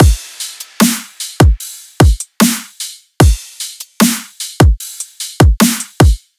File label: Drum Loop 150 Full; Ghosthack; Color Bass Essentials